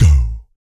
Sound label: VOC PERC, Vocal Planet Jazz FX BASS PERC